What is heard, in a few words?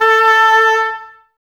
OBOES A, Woodwind; Wood Winds; Oboes Links; Miroslav Vitous; Woodwind Brass Ensemble